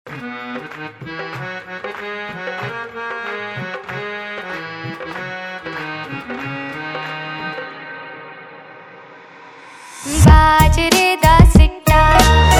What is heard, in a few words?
folk vibes of punjab, punjabi songs